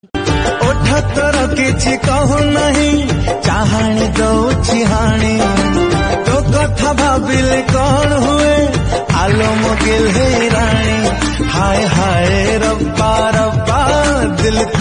odia songs

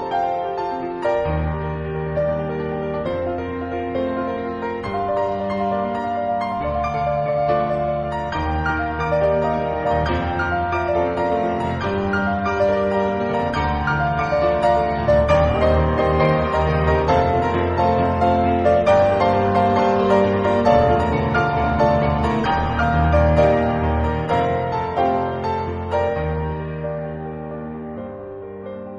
piano

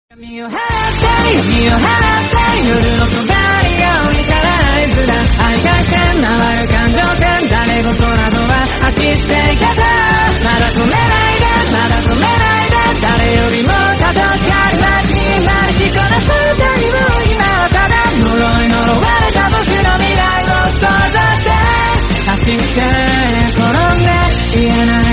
anime bgm; jujutsu kaisen

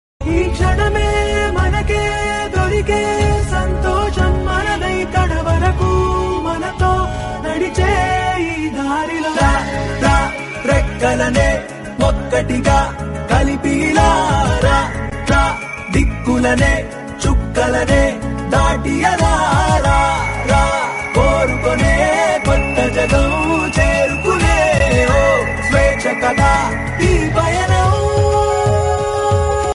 bgm kannada

